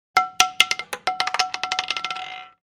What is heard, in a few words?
Casino game roulette ball bounces and stops